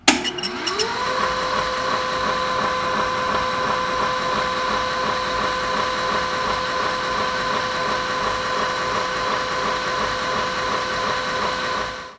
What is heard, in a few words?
Printing Press, Slow, Machine, Start, Heidelberg; Bombay Sonic